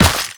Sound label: Run Dirt, Footsteps